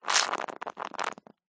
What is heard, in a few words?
foley liquid dribble weak squirt